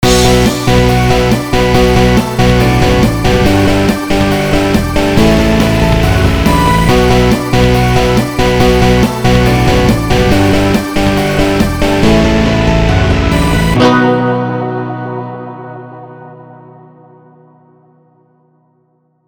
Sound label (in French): Jingle